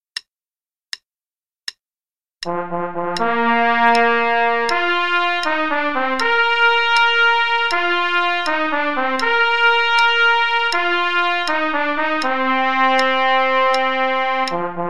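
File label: Play-a-long, easy, quarter note = 80, trumpet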